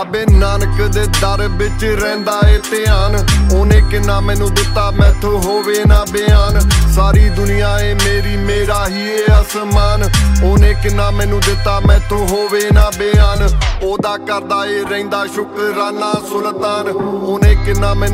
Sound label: Pendu Punjabi Ringtone